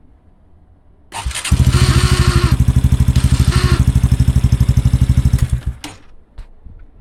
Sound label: supercub 110 pro abnormal noise